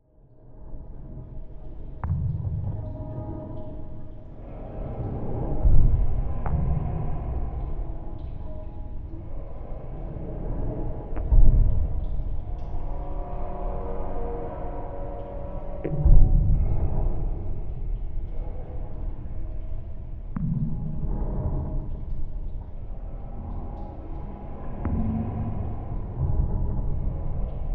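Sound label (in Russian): звуки внутри лодки; Звуки подводной лодки; звук движения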